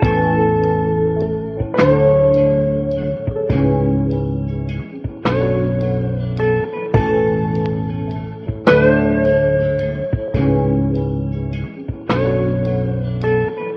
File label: guitar, Phonky Loops